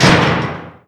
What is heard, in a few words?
facility door close; gesource; doors; facility classic